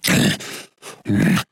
snork make hit; mutants; STALKER; customnpcs

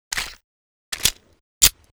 walter reload; STALKER

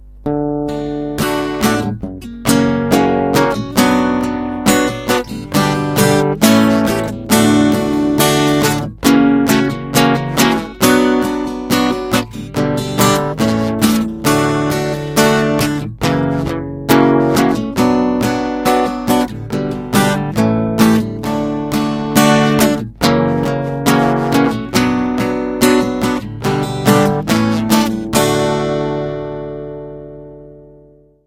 guitar; STALKER

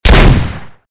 saiga12 fire; STALKER

